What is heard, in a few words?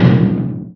Warrior Feet; Knifethrower; Playable Tutorial Level; TR2